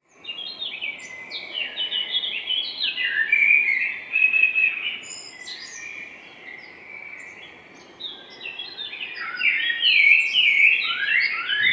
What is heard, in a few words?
birds singing in a peaceful park